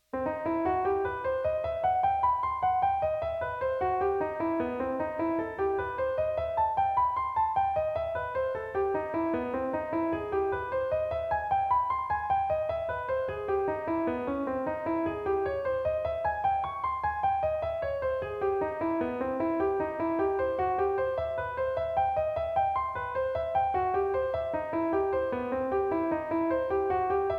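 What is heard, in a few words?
Major Triad Embellishment, jazz piano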